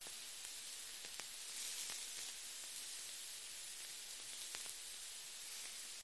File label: household kitchen cooking steak fry sizzle